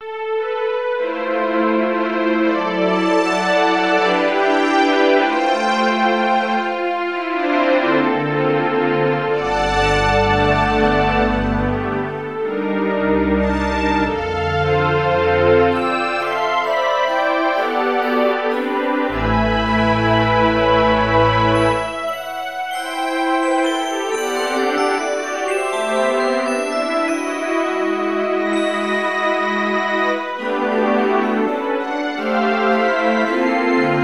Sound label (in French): valse